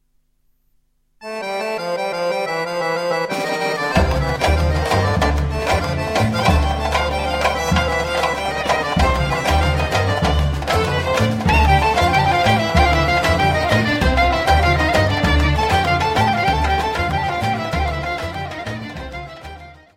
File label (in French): Cocek - Grece